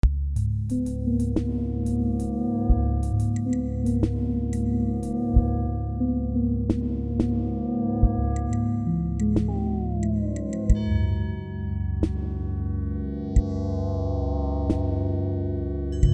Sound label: sad and bad dx7